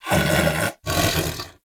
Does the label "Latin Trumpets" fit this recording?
No